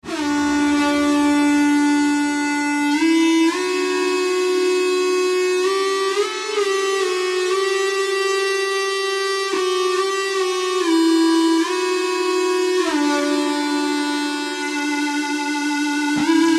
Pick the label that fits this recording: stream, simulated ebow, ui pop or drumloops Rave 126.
simulated ebow